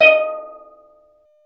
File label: Steel Drums D#4; NorthStar - Global Instruments VOL-1